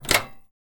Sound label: opening microwave